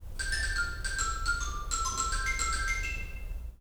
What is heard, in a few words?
xylophone